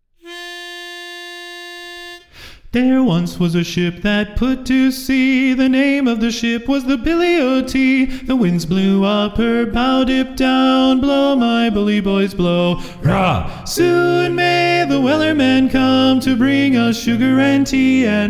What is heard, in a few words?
Sea Shanty Medley TTBB Lead